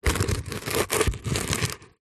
foley stone small scrape rub on grit dirt on plastic surface; zapsplat